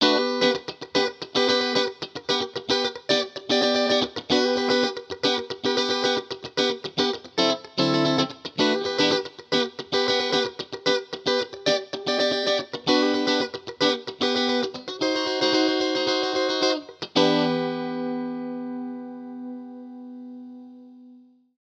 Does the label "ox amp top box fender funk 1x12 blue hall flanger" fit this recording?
Yes